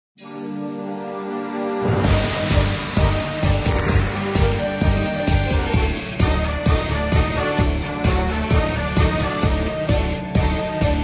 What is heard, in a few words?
tango